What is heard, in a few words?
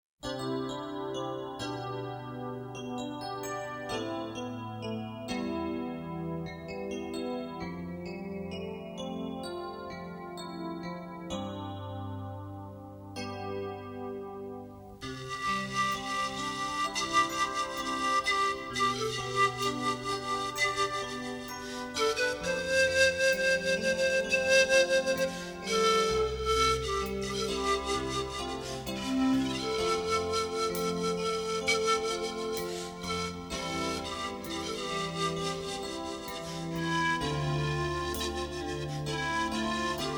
Flute